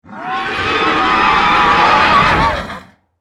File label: Horror Monster Demon Horses Scream; Evil Voices